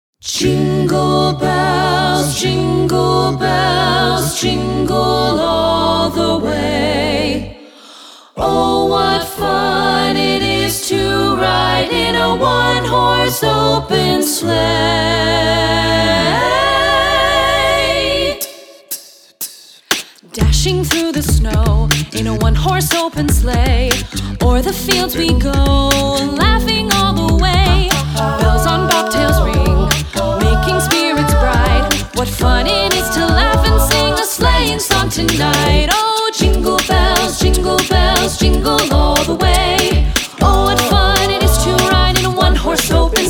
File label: Backtrack Vocals Jingle Bells